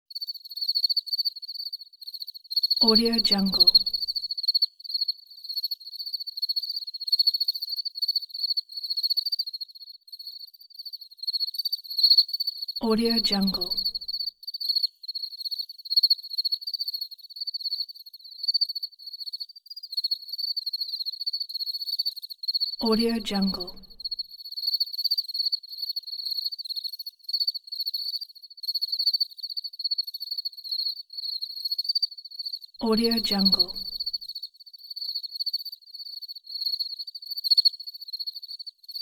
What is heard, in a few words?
field of crickets